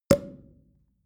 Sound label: sparkling wine cork open soft